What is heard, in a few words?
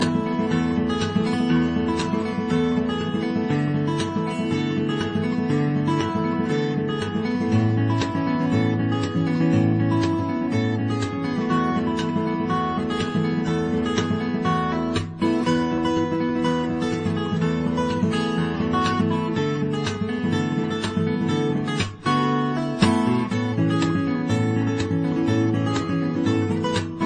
sweet country